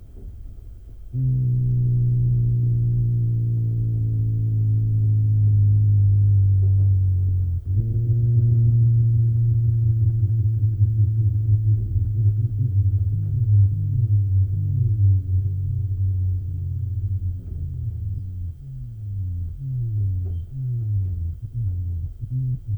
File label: Poppea, treehoppers; Cocroft